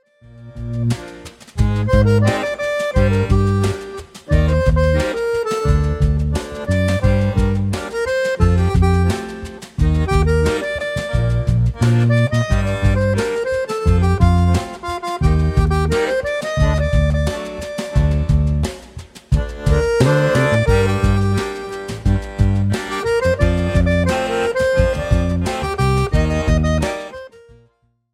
cello

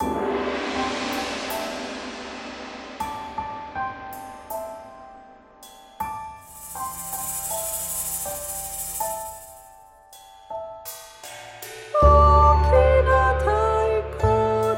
okina taiko

